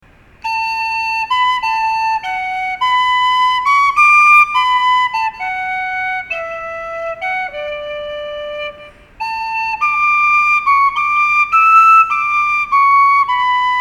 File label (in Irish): feadog harp